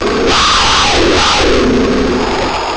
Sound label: tiger